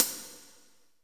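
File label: hi-hat, drums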